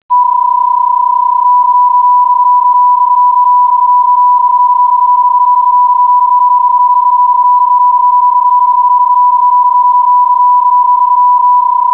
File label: BPSK31; Digitale Klangbilder nach Dieter Flasch DC1NF